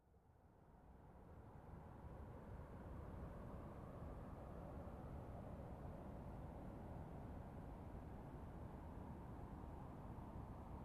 Truck passing by slowly

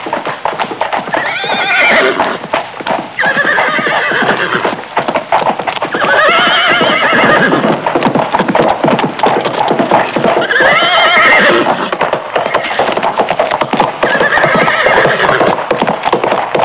Farm horses trotting and neighing

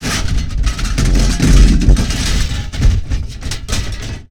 Heyva metal debries rumbling

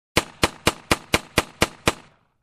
Fast multiple distant pistol shots

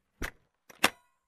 Suitcase opening

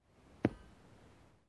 Horse hoof step

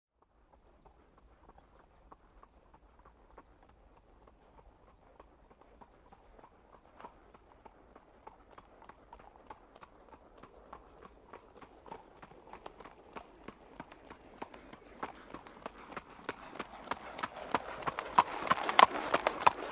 Horse Gait incoming

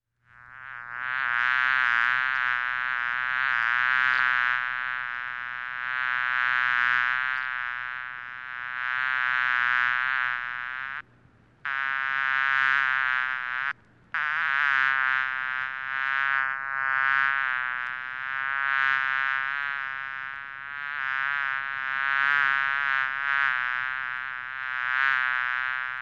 Buzzing Fly

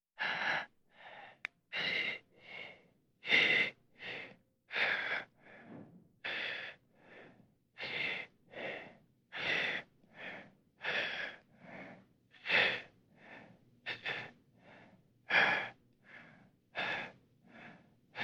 Heavy Breathing

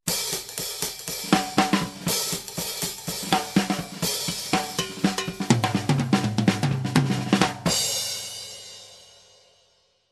Fast Jazz drum fill

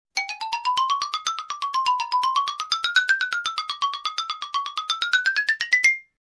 Xylophone scale up and down variant

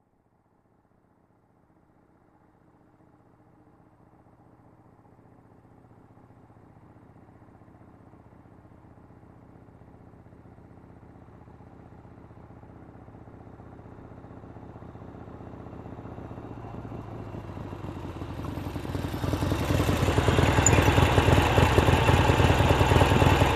Motorcycle approaching and parking

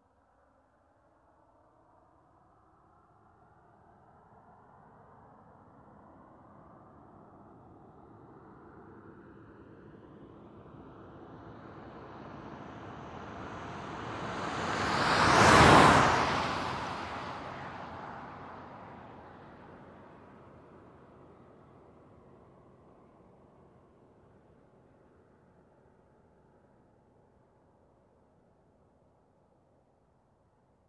Car passing by with medium speed

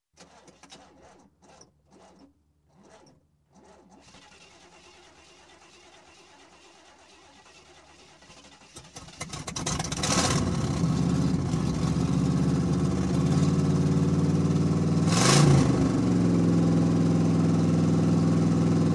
Starting old car engine

